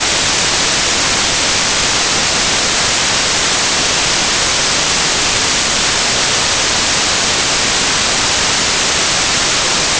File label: White Noise; kelvasa2015